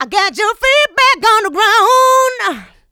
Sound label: VOCA, Houseworx